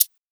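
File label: percussion, Drum & Bass 160 BPM; Ueberschall; Drum & Bass Flash Trackz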